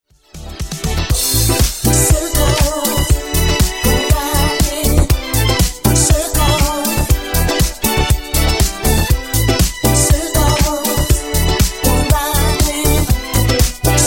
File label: Disco Funk 120Bpm